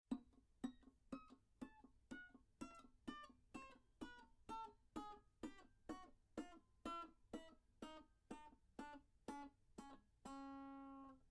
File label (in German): tapping gedaempft, banjo